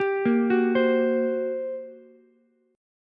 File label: G Minor Major 11th G, Ukulele, C6; arpeggiated; 12,10,14,15